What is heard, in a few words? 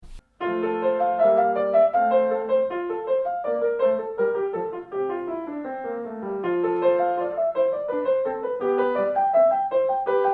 Allegro in F